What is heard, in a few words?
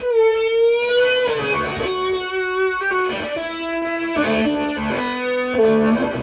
Snake Scream, Electric Guitar; Sonic Foundry (Sony Creative Software); Friendly Fire; Serpent